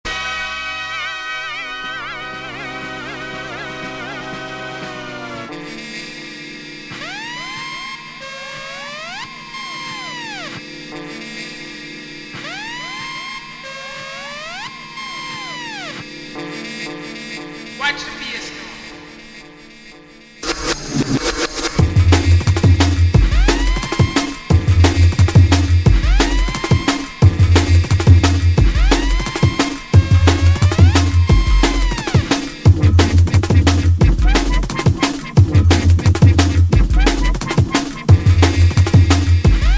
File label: dnb; 2000 era